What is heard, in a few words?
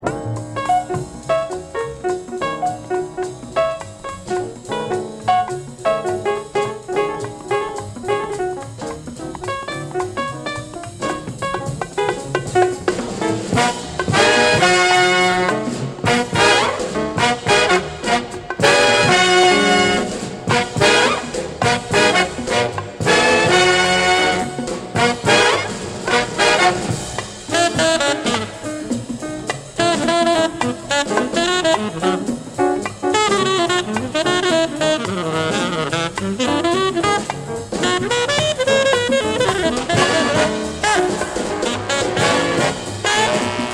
sax no end, sax